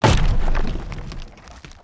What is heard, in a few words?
Chest Destroy